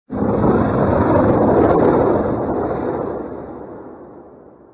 Grito; Grimmsnarl Gigamax

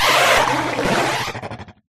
Grito; Hydrapple

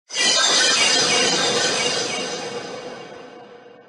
Grito; Orbeetle Gigamax

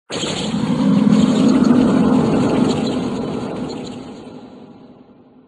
Grito; Appletun Gigamax

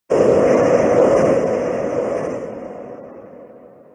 Grito; Lapras Gigamax

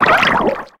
Grito

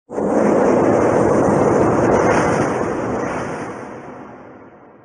Grito; Copperajah Gigamax

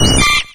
Grito; Caterpie

